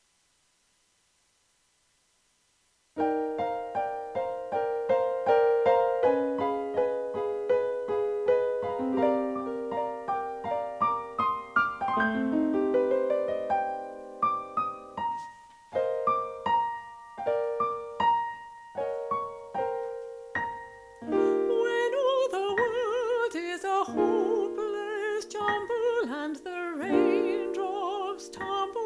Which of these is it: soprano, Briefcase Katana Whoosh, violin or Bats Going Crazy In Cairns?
soprano